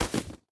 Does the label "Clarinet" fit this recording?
No